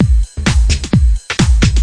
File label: bassy swing house, drum loops and breaks